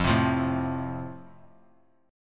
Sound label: F Major, F Chord; strummed